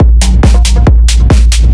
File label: drum, 138, drum-loops, Techno; Techno ID; fatboom